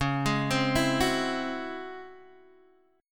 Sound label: Db Minor Major 11th Db Guitar Open D